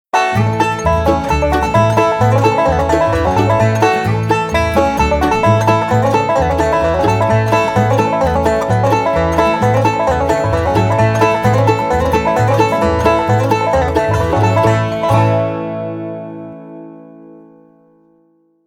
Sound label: Banjo